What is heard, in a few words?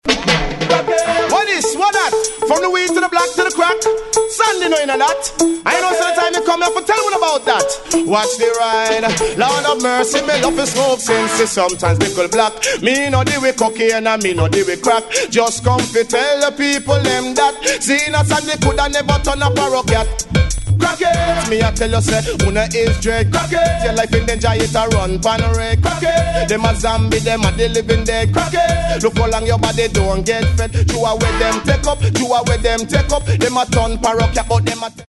SAX